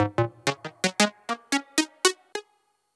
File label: SYNT, Hip-Hop & R&B; USB Soundscan vol.47; AKAI